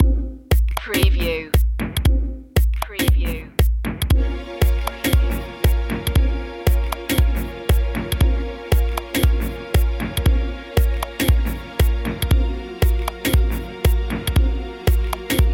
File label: ethereal engine no vocals